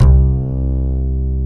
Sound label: Acoustic Bass